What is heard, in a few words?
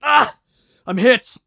hurt medium; Escape from Tarkov